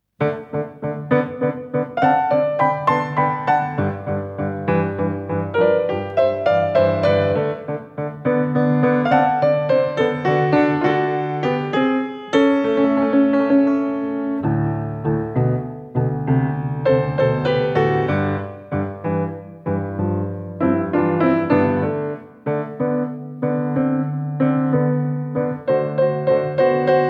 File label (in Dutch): karaoke